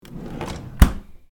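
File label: refrigerator; cooking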